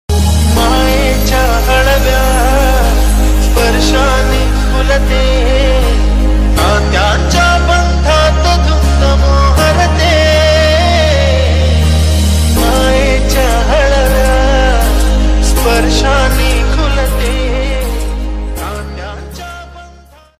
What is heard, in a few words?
aai ringtone marathi aai ringtone